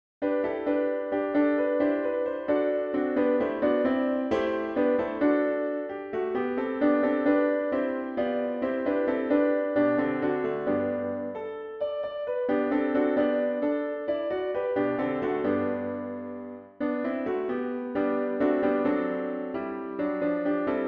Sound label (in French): allegresse piano; allegresse pour qui s'abrite en toi